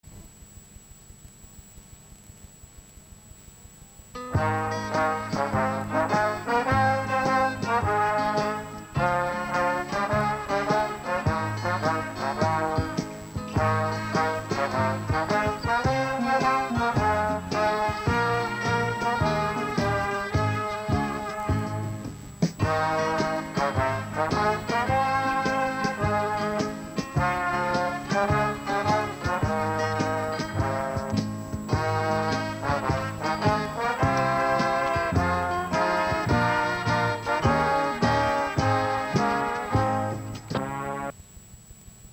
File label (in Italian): John Brown strumenstale